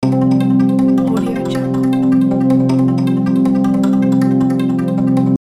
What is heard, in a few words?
game show background loop